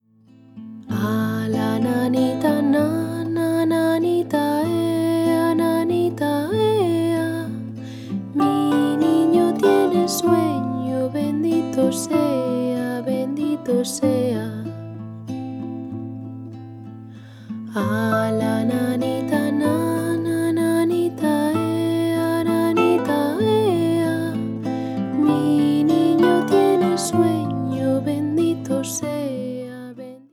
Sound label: lullaby, Spanish; A la nanita nana